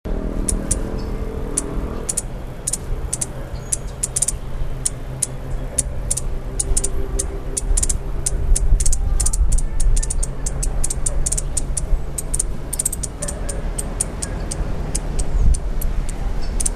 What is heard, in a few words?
Thalurania furcata male